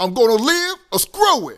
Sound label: Rap Vox